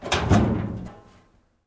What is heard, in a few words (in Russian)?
garaj vorota open; FEAR